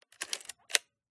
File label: Camera Film 35mm SLR Film Advance Wind lever; Pentax K1000; MKH8040